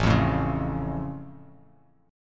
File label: A Augmented A down; strummed; JGuitar